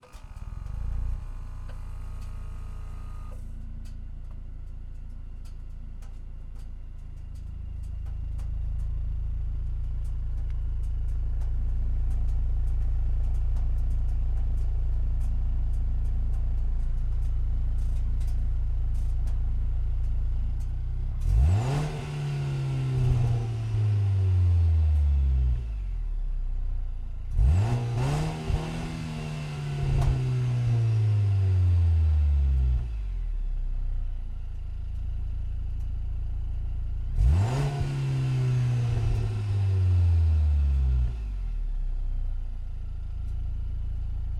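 volvo xc90 exterior start idle blips off, Volvo XC90 2017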